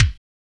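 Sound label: BD, bass drum; X-Static Goldmine 1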